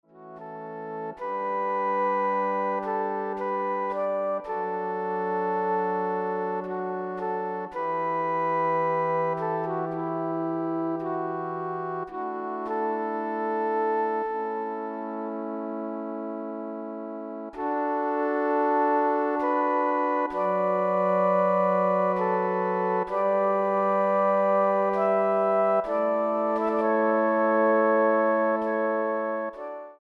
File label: Flute Ensemble